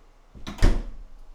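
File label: doorknob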